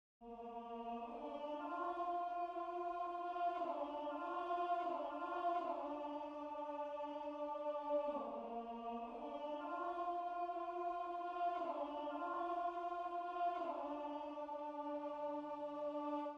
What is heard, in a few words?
Tenor